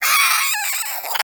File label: Vocal Death; Warframe; Hunting Critters; Cryptilex